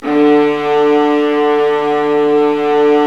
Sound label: Violas Bright, String Sections; Roland LCDP13